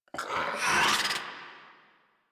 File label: Infested Charger Run Breath; Warframe